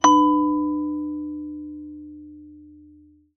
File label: notice